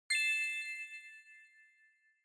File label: Bell Accent, Low Dry